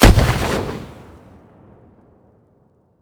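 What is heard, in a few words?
m203 detonate smoke near, grenade launcher; ins2; weapons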